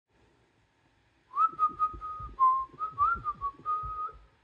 whistle; he-man

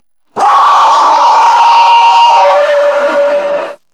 jumpscare vocal only

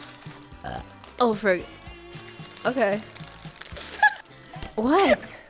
burp

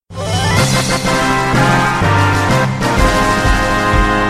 soundtrack victory sound; final fantasy viii

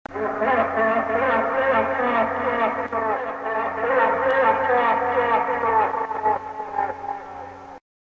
laugh; baltan seijin

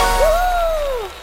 uhu; fall guys

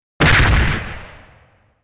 hit

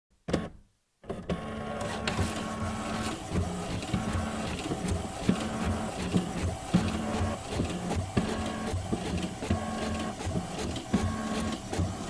printer fotocopiadora

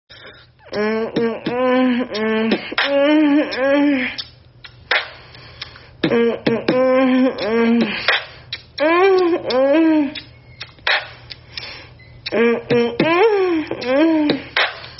a hhooh beatbox

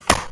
slap

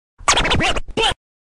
cool transition

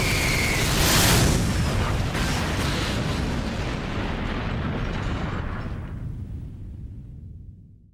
mechanized aerial hit

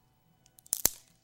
neck snap

